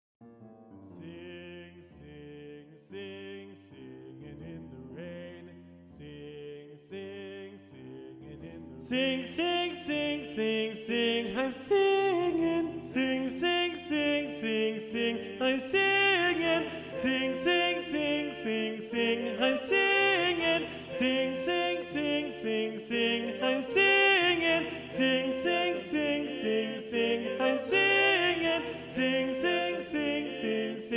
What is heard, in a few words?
Alto Predominant